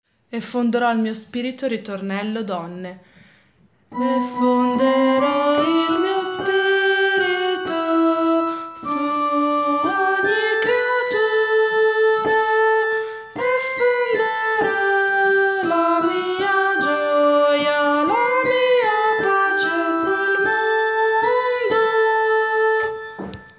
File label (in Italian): donne